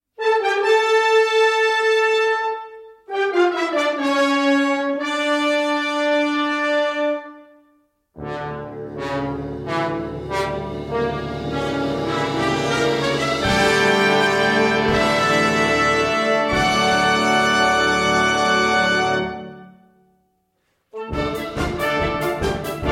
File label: TOCCATA